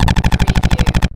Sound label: Pulse Rifle Machine